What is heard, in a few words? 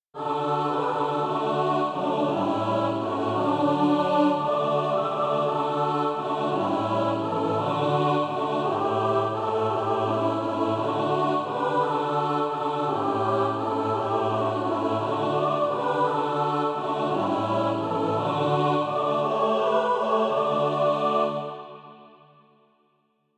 mixed